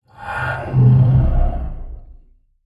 Spirit Rumble Scream; Ninja Tracks

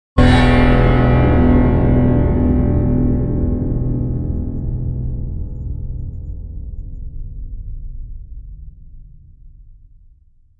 Piano Deep Sustains; Epic Tools vol. 1

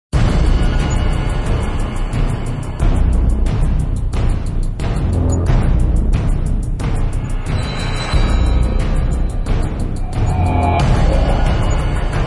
Percussion; Fired Earth Music

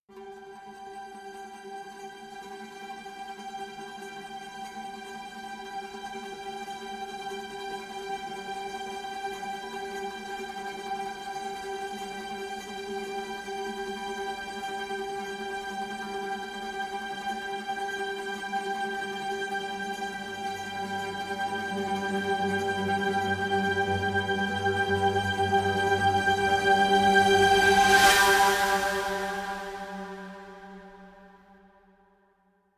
Suspended Trems (G); Brand X Music; Brand X Music Catalogue - Editor's Essentials

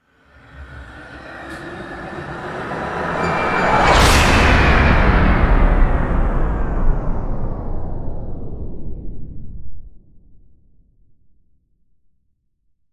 Barren Hit Downer; Ninja Tracks

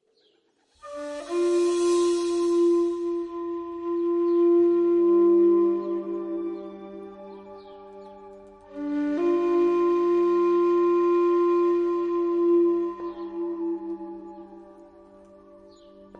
Tibetan Bowls and Flute Duet

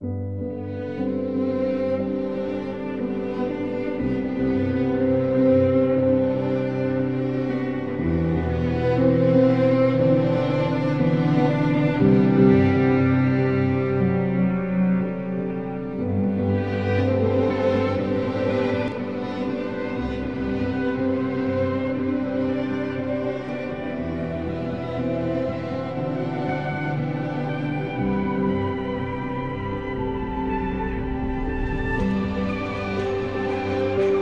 Passion Ambient

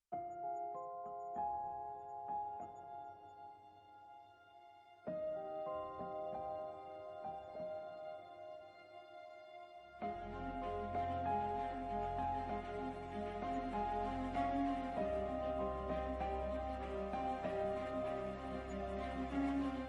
Dark Forest (No Vocal)

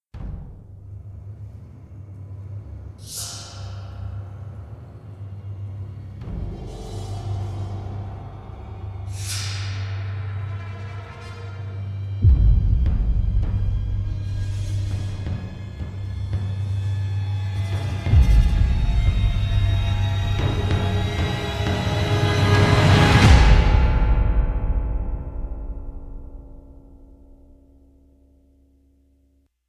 Destruction, Relentless Percussion; Gothic Storm Music

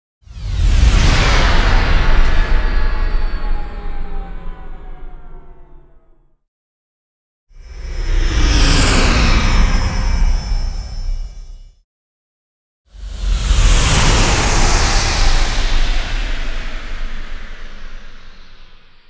Swooshes (Bending); Epic Tools vol. 1

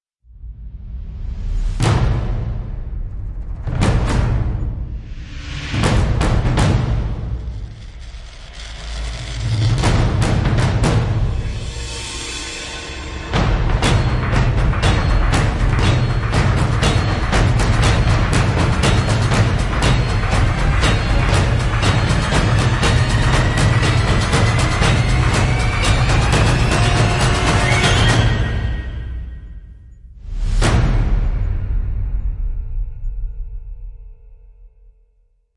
Battlespace, Relentless Percussion; Gothic Storm Music

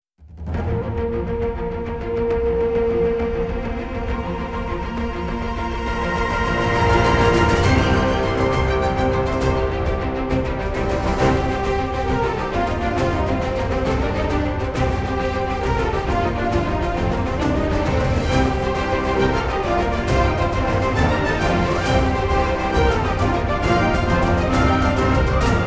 Celtic Knights (No Choir); Fired Earth Music

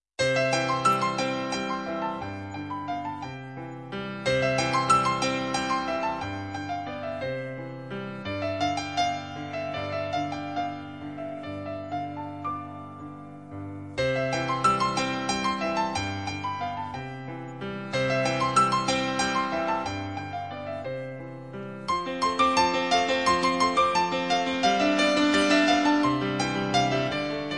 Rondo Pour Un Tout Petit Enfant (piano solo)

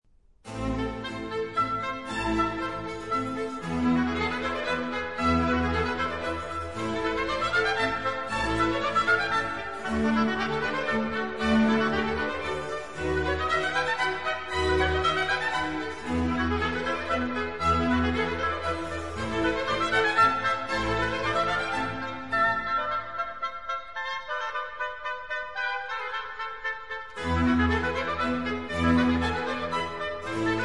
Concerto for 2 oboes, strings + b.c. in A minor; Oboe Concertos Vol. III; Allegro

